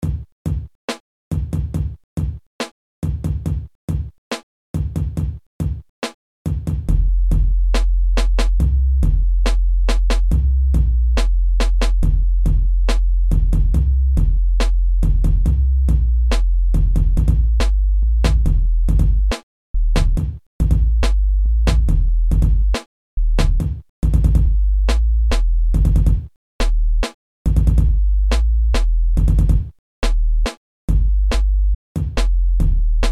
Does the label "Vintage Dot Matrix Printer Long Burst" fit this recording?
No